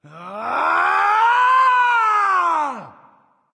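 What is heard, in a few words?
nonverbal battlecry; smite; voicepack; ullr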